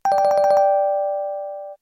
BBM Phone

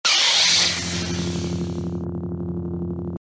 Sith Lightsaber

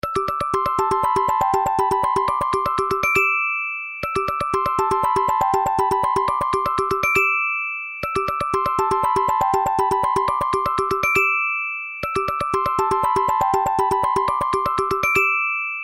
Bells

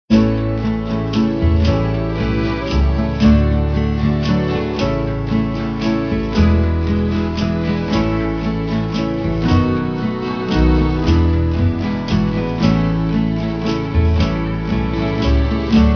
Irish Music

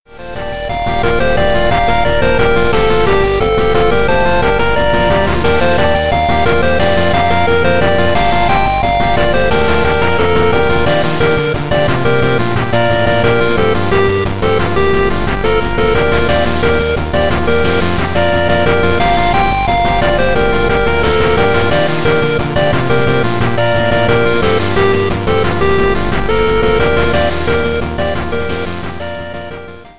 8 BIT

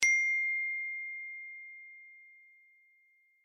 iPhone note

